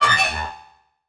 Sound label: Multimedia Internet CD-Rom Flash Hi-Tech